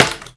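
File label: crossbow shoot; The Elder Scrolls III - Morrowind; Morrowind GOTY GOG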